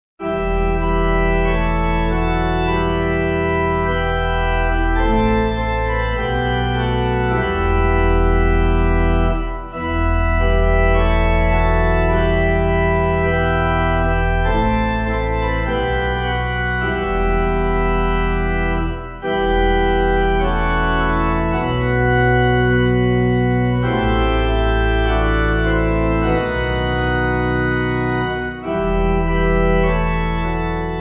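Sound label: Pipe organ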